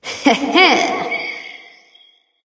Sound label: heh heh light; smite; voicepack; hel